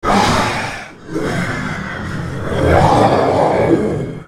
dying; Nocturne

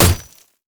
basic attack; olaf

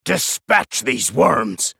attack; Swain; en US

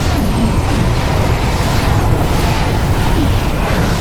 loop; karma base

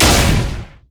crit; LoL; lucian

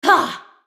spell cast effort; Leona

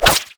basic attacks; Zyra

